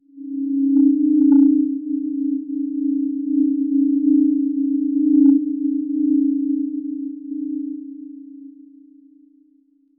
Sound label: 417 hz; clear negativity; healing